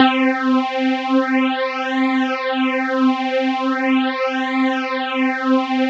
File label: analog saw phaser, yamaha reface cs